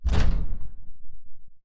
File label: atlas footstep jog; Titanfall 2